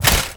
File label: metal armour pickup, inventory; ui